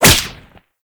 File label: Whip Impact Default; melee; weapons; Oraxia